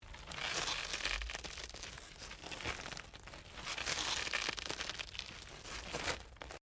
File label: WRECK WINDSCREEN BROKEN CREAK, Wrecked Cars; impacts, crashes, scrapes; Sound Effects:Objects